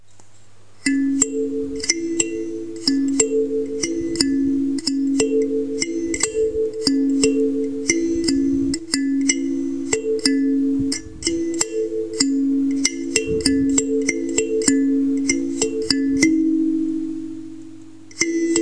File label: thumb music